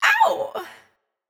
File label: adlib Oww wet, vocals; Slate Digital Omnivox Vocal Sample Pack; Adlibs